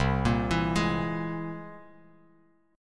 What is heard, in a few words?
C Augmented 7th, Bass Standard, chord; arpeggiated; JGuitar